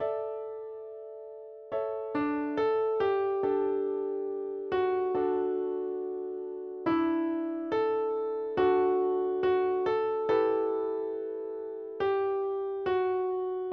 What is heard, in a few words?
instrumental long